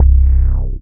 DM BAS, Dance Mega Synth

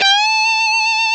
fuzz guitar; CMI Sound Library Rev 1.3; GUITARS

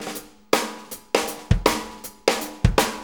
Shuffle Fill, Drum Tools; Sonic Foundry (Sony Creative Software); Loops & Samples Drum Tools; Shuffles; Fills

